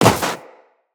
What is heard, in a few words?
Run Snow, Footsteps; Tenno